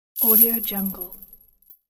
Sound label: bells